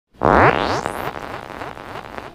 pick up sound; puppet combo

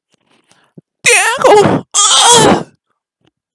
ahh

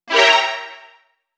fail alert

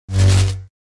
lightsaber whoosh

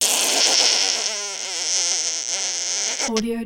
fart sound

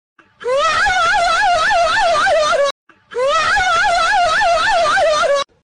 laughing guy

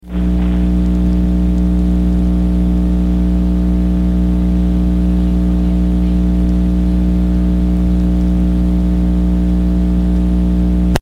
buzzing noise

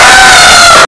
jumpscare sound; fnaf acp 2

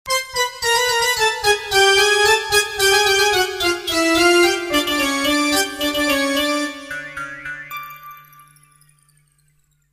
circus tune

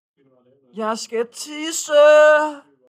toilet

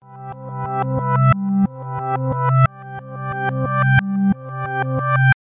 discord call reverse

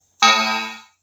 windows error; windows 7

